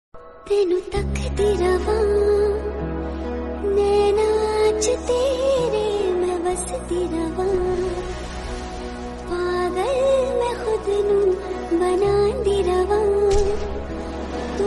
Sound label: female version